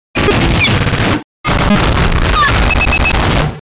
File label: 8 Bit Glitchy Noise